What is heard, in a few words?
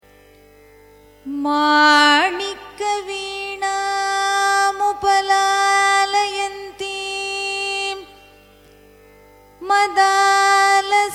carnatic song saraswathy sloka dhanyasi; kalidasa